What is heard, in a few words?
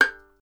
STR VLN, Solo Instruments; Miroslav Vitous